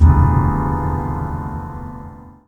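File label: bullet impact piano; Stranglehold; PS3; Xbox 360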